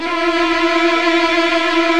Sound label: VIO MIN TRIL